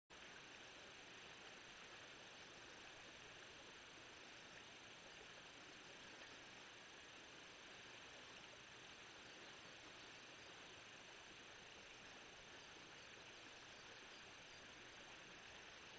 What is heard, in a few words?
riverside